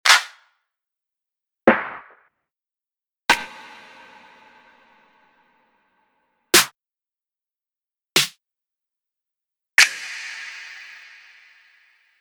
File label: Snares Claps